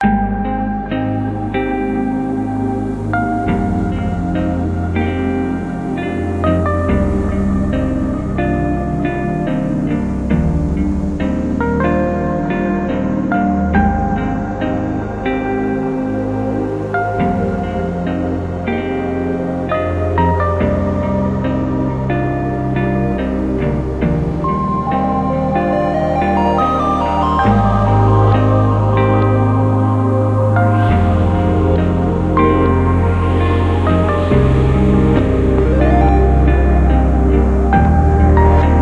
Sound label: Mus Grasslands Stem; Epitasis